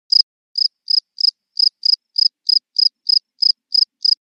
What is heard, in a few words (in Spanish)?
el grillito; animals